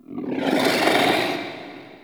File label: Interior Moan Gurgle, Infested, Ambience; Misc Indoor